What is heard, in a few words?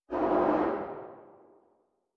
gun far